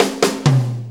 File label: 4-4 Fill, Drum Loops; Drum Tools; Fills; Sonic Foundry (Sony Creative Software); Loops & Samples Drum Tools